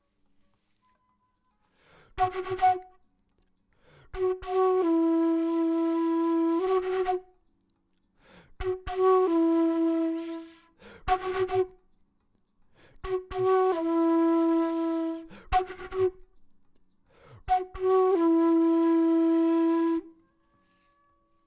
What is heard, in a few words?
flute intro; logic